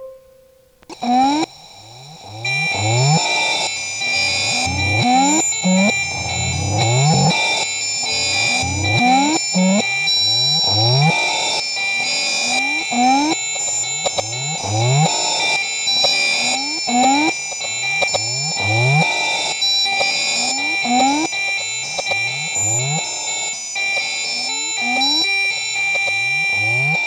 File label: wack beats underwater